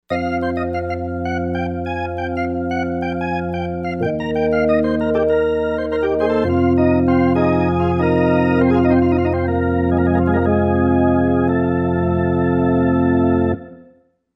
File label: Dirty Syn B